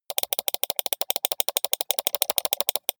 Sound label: computer mouse click sequence steady long